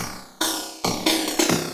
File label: drum loops; bb drum loops